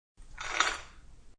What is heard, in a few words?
Ice in Glass, Pouring ice cubes into a glass; Drink Mixing (Sound Effects:Bars)